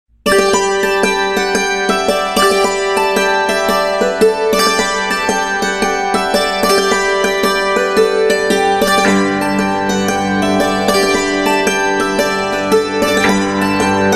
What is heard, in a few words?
jig